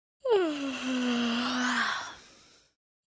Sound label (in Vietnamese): tieng ngap buon ngu phu nu con gai